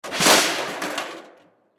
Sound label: Crash Debris Junk Metal; Sound Effects:Materials; Crash Debris Junk Metal Movement; Blastwave